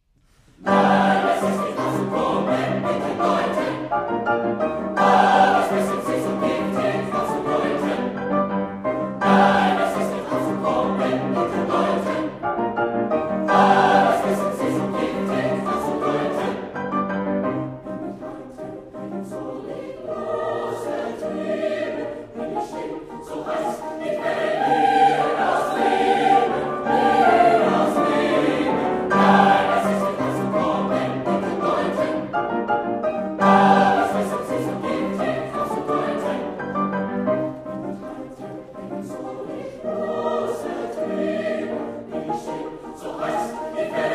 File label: college chorus sings brahms; swarthmore